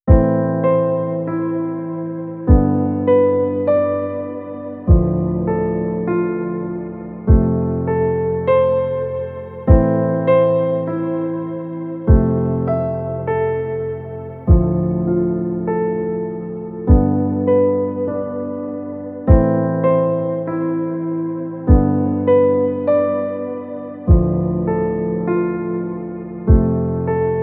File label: dramatic no violin; by burak sound bank; dramatic dram vol 11